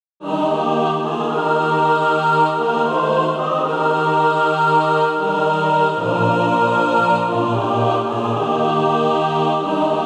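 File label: mixed, Practise Music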